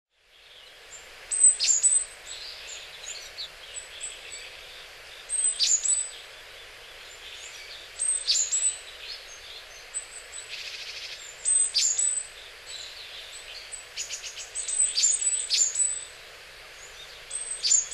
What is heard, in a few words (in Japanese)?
sijuukara, hyogo